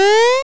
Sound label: Jump; AtomicGameEngine; PhysicsPlatformer